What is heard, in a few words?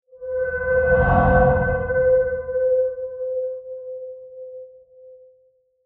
Fast Moving Horror Transition With Scary Bright Signal; Scary Soundscape; Scary Soundscapes; CraftedSignals